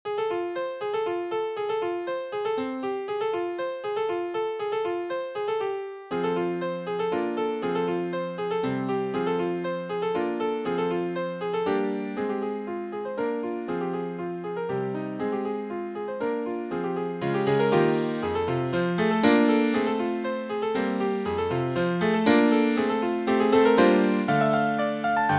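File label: Folk Tune, piano; Naigrysh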